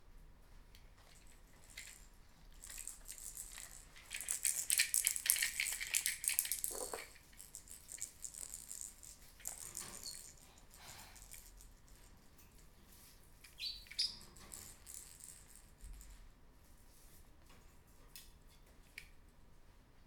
Babys Rattle

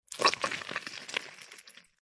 Body Pacts Splat Gooshy Impacts

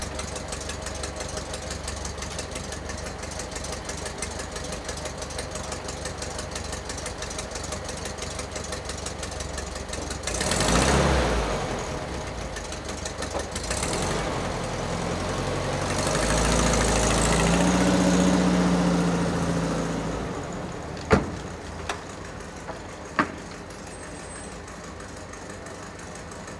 Volkswagen Beetle

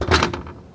Chainlink Fence Footstep

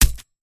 Hit Impact

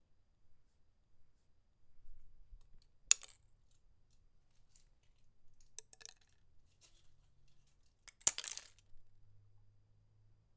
Dry Leaves Falling On Cement Floor

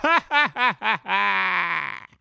Laugh

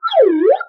Slide Whistle Down Up Fast

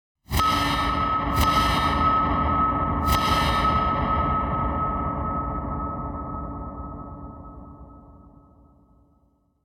Dramatic Triple Metal Clang Stylised Gunfire

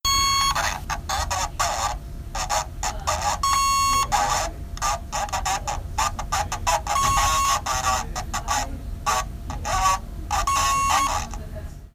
Fax Voice

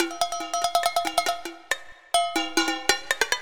Loop Lata 140 Bpm

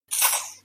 Short squirt

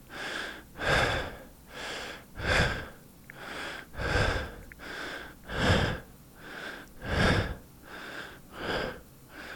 Heavy Breathes

